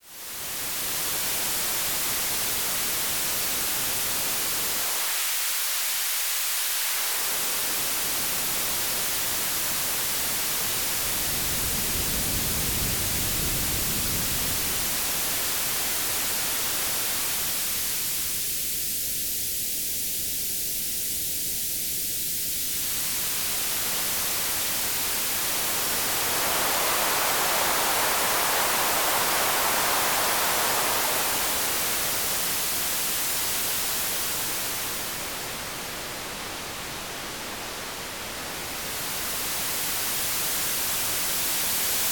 EQ Sweep Noise; DJ-Tech X10

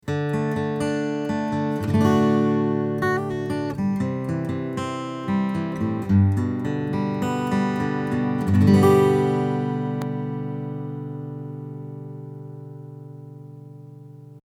Git Picking HPF 80 HZ; UR RT2; NEVE; DPA4099

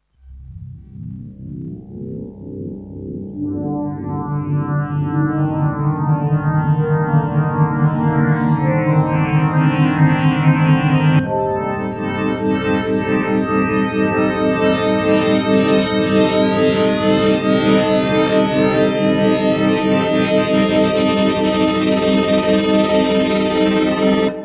DX7IIFD Phasar Whasars

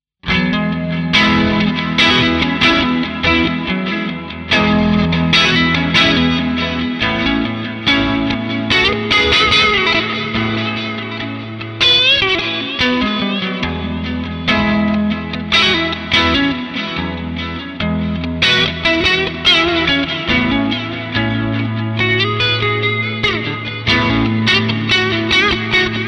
Clean; Gojira Plug-In Suite

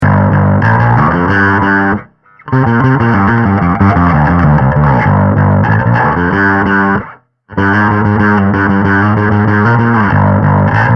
Rock Drive

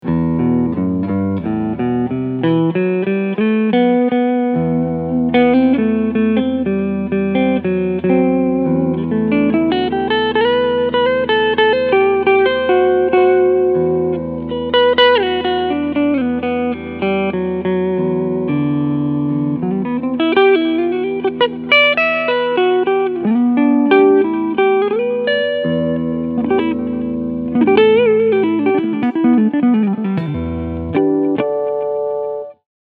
Cleansound Front Humbucker

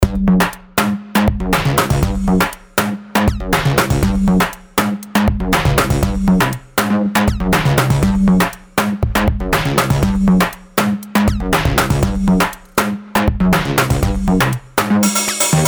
DrumComputer Bass

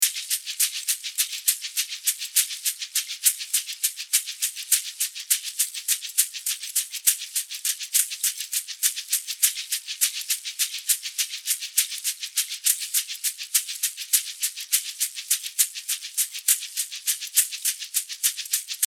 shaker IN

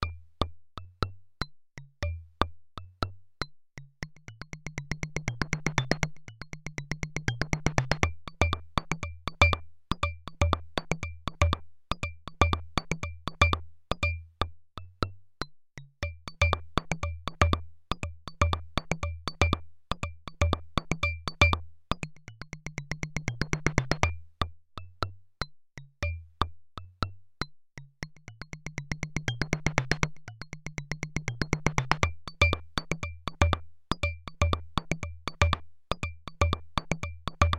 hand drums Notch Peaks

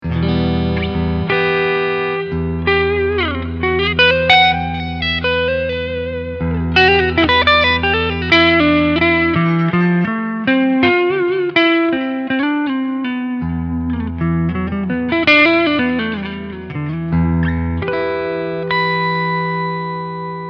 Front Humbucker Clean

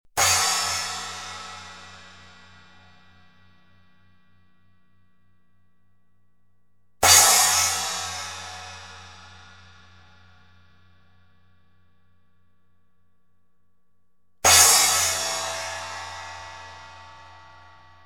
Cym

